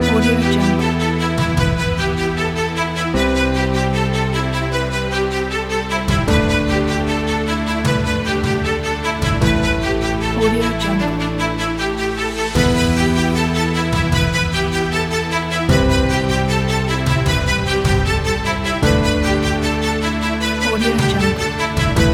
epic orchestra

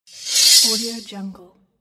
sword swing; nerso-77

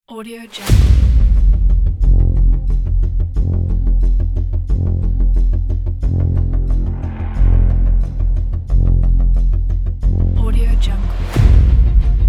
epic dark suspense blockbuster

